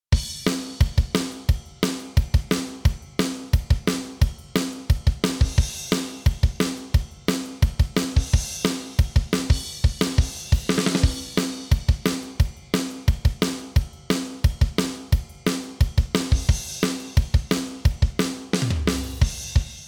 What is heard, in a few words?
drum On